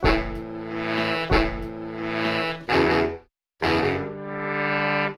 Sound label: sax; Phonky